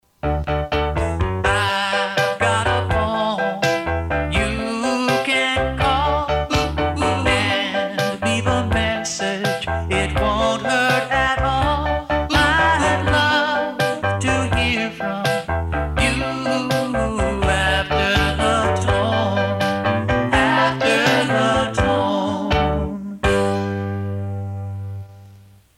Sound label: Telephone Tones 50s Tone; ATT Telephone Tunes